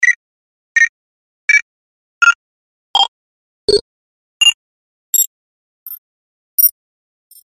Beeps Digital Multi

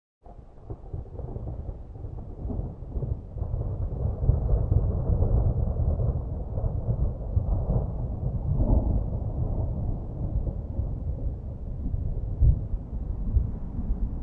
Thunder; Medium Distant Dry Rumble

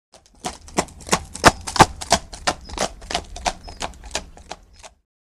Away Horse Start Away

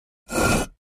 Cinder Block Scraped

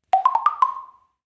Percus Plastic Block, percussion blocks

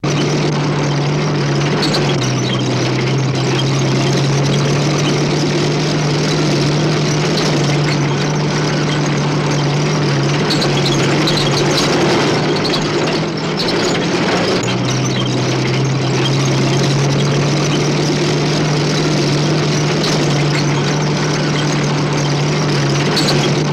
Tank Run Steady, Looped, Side Point of View

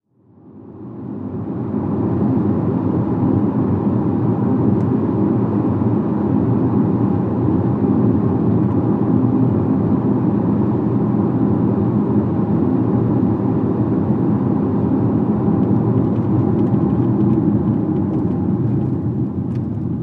Sedan At 5565 Mph S

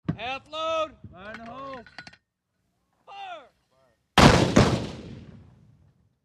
Canon Mortar Hlf Shot, 81 mm Mortar Exterior; WEAPONS - CANNON 81 MM MORTAR: EXT: Half load, orders, single shot

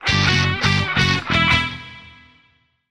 Music Classic Rock, guitar

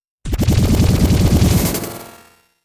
Record Scratch, With Ascending Flutter Echoes.; Music Effect; record players

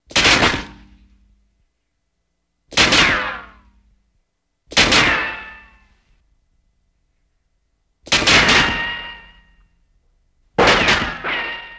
Bullet Impacts Vari, metal

